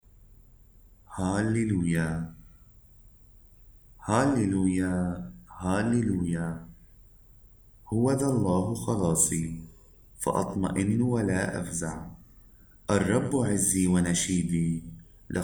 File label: Halleluiah, arabic mass